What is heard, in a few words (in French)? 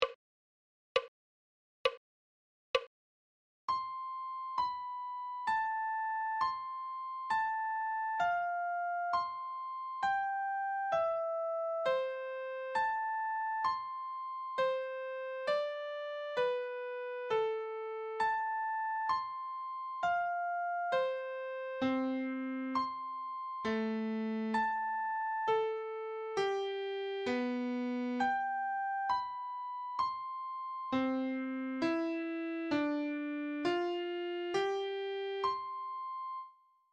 clé de sol adagio